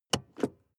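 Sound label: sports car interior handbrake release medium; verkehr-transport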